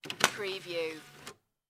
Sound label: CD-Rom Disc Tray Open; Computer